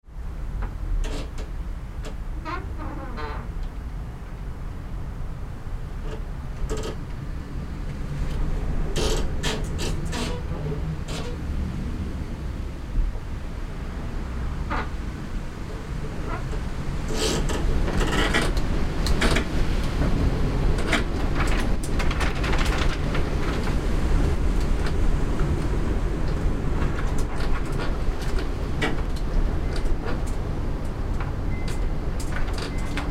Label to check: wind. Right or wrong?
Right